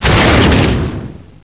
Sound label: Explosion Sml; XWing